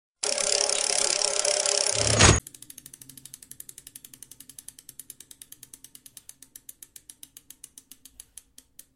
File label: start spins animation